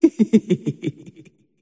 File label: laugh; dota; drowranger